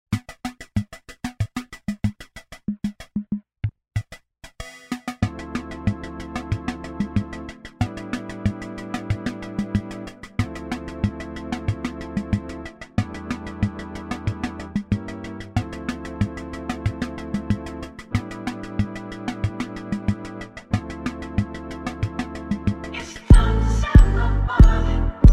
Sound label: Samba